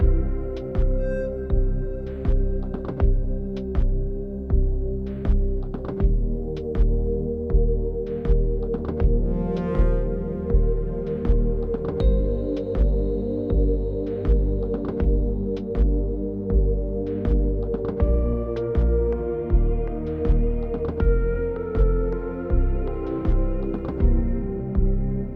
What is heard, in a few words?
Exploration Night 8CH 80BPM; Downtown Act2